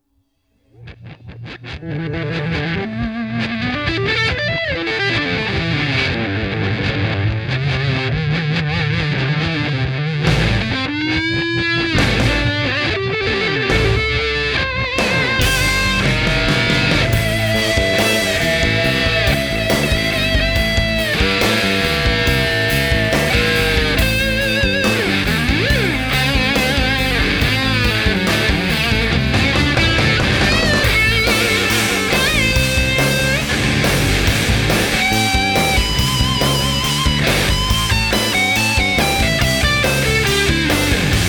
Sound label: Clasic Fuzz